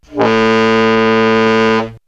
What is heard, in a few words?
Horn; X-Static Goldmine